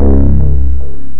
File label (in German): bomm; Amiga Soundtracker Sample Packs